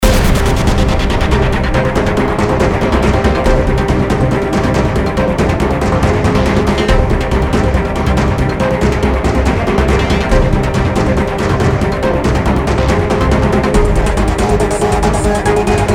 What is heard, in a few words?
Epic Electronic Trailer; Air Guardians; Windows